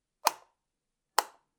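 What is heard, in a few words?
switch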